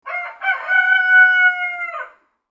cock

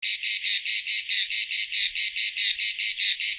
loop perc

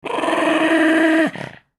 mad dog

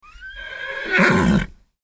horse

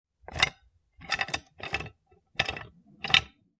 opening click

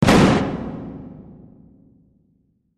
firecrackers

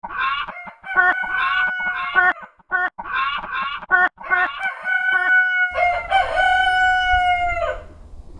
chicken

